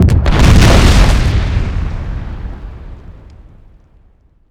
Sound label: explode; bombinomicon